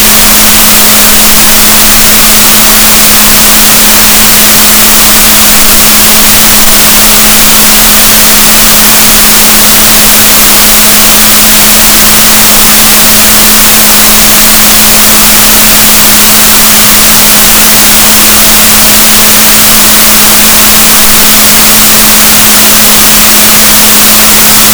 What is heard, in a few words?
rorquals negatif, gaussian noise, Balaenoptera Physalus Chile